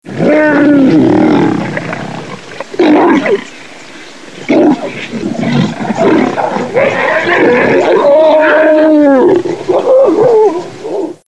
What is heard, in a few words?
lion